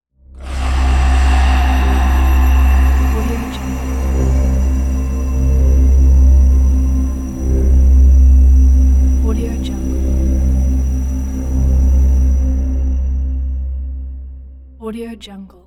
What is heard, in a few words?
ghostly horror transition; applehillstudios